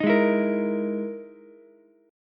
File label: E-Major Sharp 11th E, Ukulele C6; EM#11 strummed; 8,8,12,13; JGuitar